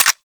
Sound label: Tranquillizer Rifle Mech; Warframe; Weapons; Tenno